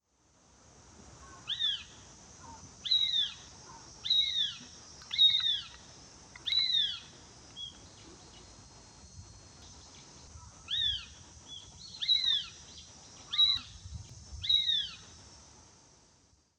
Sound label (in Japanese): オオタカ 幼鳥; さいたま市